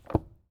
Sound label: book